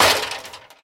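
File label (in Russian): удар с психу по двери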